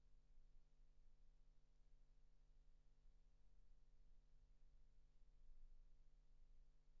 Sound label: silence 48kHz